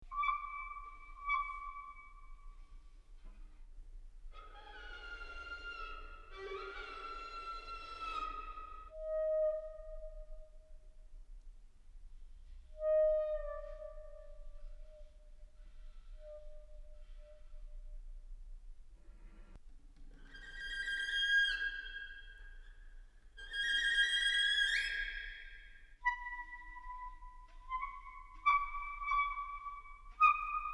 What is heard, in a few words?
Night Noises Almost Morning